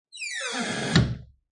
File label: Wooden Door Squeak And Close; Creaky Doors